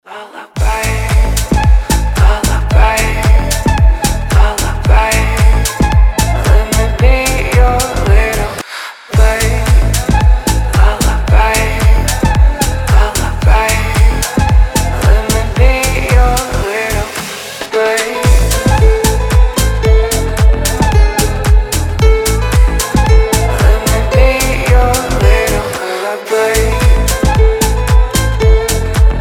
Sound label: organ, deep house